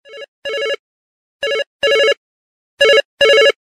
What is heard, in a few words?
Telephone Ring, LG GM600